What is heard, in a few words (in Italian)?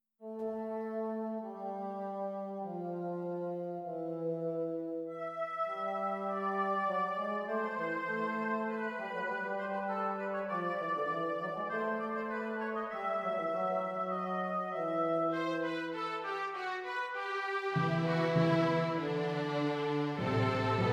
Orchestrazione; Ronge; Gerd Prengel